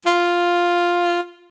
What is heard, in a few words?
Saxtone